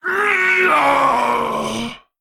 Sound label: Male Golem Death